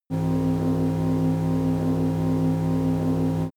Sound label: generator buzz